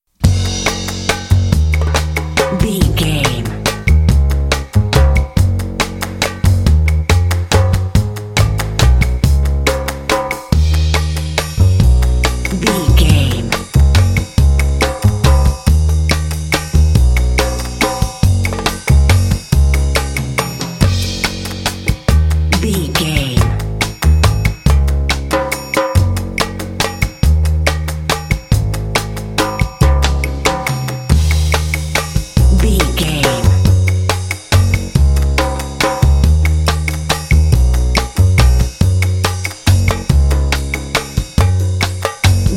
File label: quirky tiny waltz